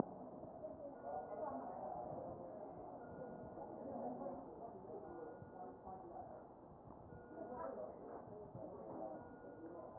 chest

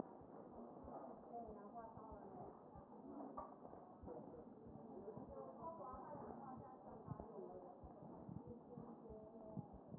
chest

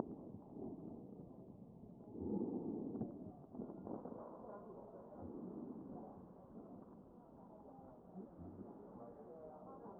CHEST

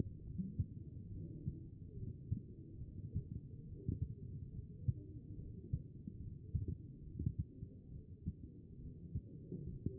A CHEST; B MODE

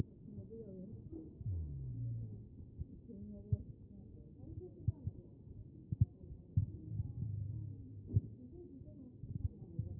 A CHEST; B MODE

